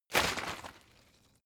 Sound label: bottle water sparse shake single; berufe